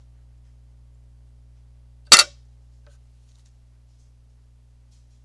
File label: Claw Hitting Glass